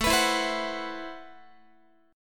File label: A Minor 6th Add 9th A Guitar; strummed; Am6add9